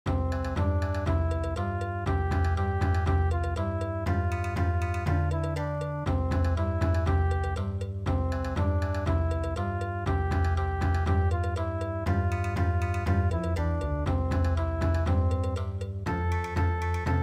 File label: Bon Odori